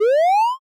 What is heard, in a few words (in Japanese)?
nobiru